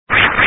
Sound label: Spin